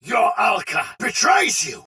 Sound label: attack heavy soldier sword agent male; Dialog; Barks; Battle; Melee; Sinecure